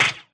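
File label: impact bullet generic titan shield; Titanfall 2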